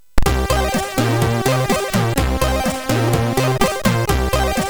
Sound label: Sound Test, MOS6581R3